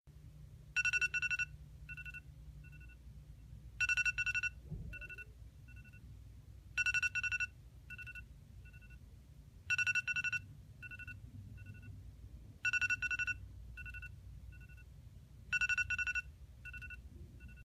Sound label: Iphone Radar